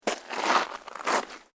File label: SNOW CREAK